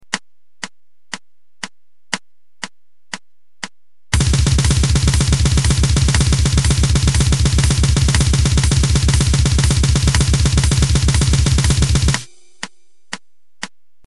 blast halftime

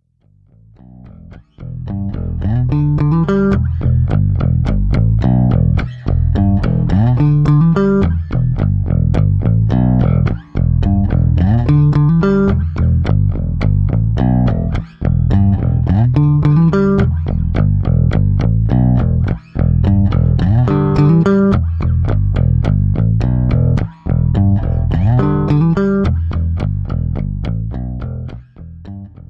danelectro dead on58 longhorn both pu; plek

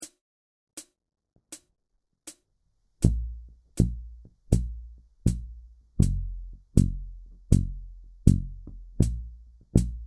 excercise, 4 finger; achim seifert

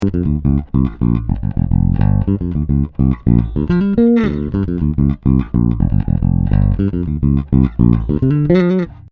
both finger, bass; bassart relicmaster

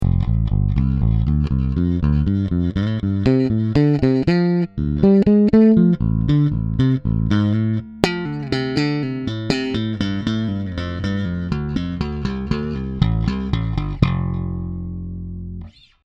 ibanez jtkb 300 mittel hals pu, bass; jetking

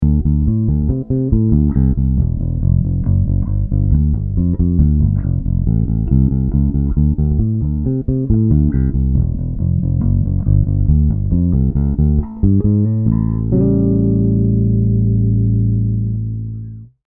fingerstyle, bass; ampeg ada4

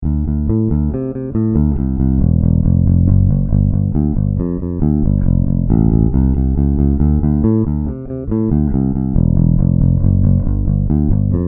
pick, bass; ampeg ada4

